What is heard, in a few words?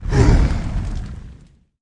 hurt; huoyuansu